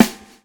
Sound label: swing